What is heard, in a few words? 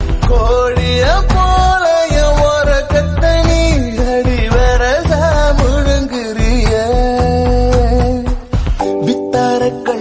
Tamil Song; Nedunchalai